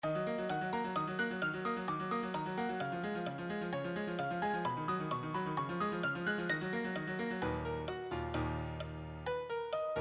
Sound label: advanced solo, piano